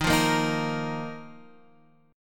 D sharp Suspended 2nd D sharp, Guitar Half Step Down; chord